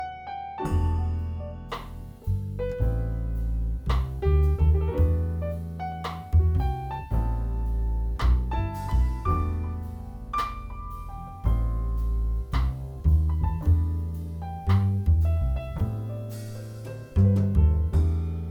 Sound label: jazz ensemble without